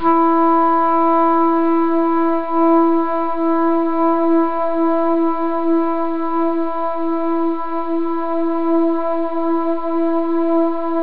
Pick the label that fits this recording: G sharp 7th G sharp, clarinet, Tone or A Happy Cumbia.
clarinet